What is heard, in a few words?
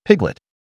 Piglet; on tap ve chu de dong vat